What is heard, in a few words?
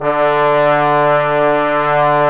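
BRS BONES, Orchestral Ensemble; Roland L-CDX-03 Disk 2; Orch.Ensembl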